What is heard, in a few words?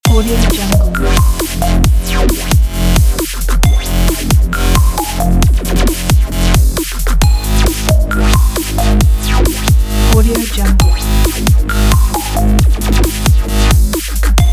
breaks loop